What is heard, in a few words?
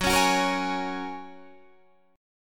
Gb Major Add 9th, down, Guitar, Open D